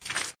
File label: footstep wet